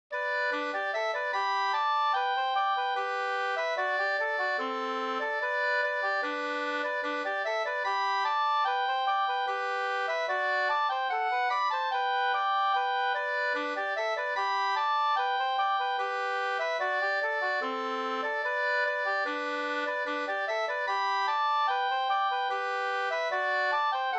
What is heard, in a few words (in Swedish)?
reinlender